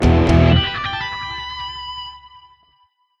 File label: Level Up Sting; PWND